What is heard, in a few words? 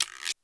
Long Guiro, Drums, Roland JV 1080